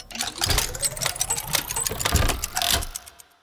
sfx relic activation continuum; astral planes

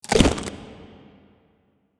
kaiju adult chomp; biogenesis

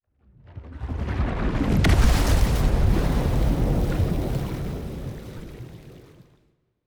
colossus megastructure hit; aquatics

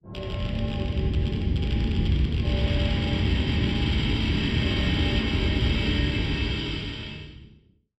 georadiation bell; Stellaris; extreme frontiers

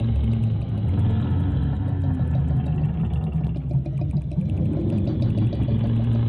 cutholoids idle; Stellaris; grand archive; ships

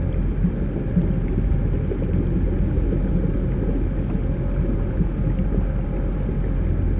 colossus muzzle; aquatics

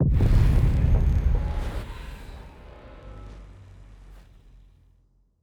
ship terralize; ships; azaryn; Stellaris; paragon

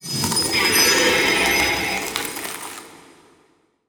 crystal, lithoids; lithoid portrait; Stellaris

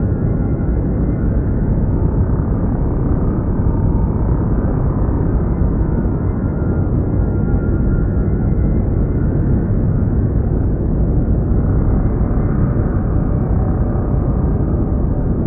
hum; Stellaris; megacorp; interstellar assembly

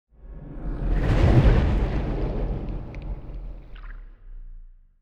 colossus move; aquatics

